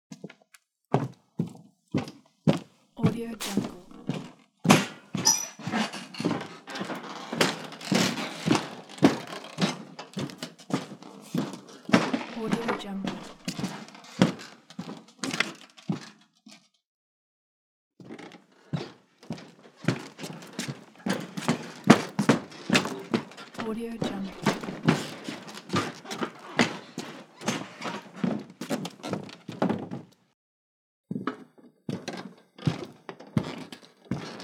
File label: walking through old warehouse